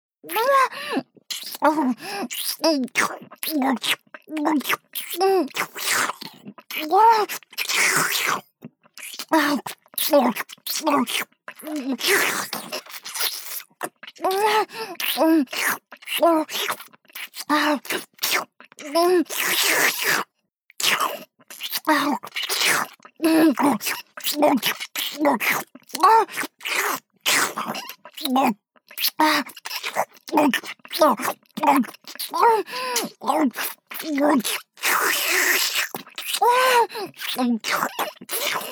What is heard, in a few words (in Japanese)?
下品 フェラチオ ループ